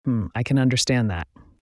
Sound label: hmm